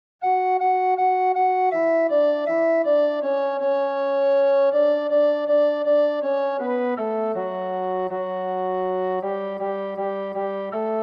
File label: Karaoke; Ngeia e Otua mo ene Ofa